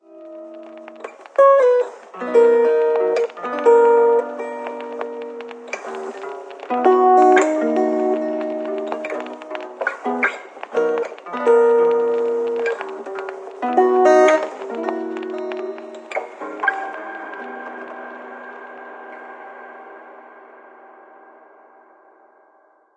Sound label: Gramophone; Ether One Redux